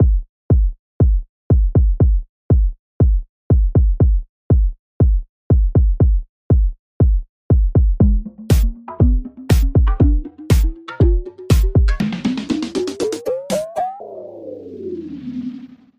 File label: Mixed Drums 120 C; Beach Day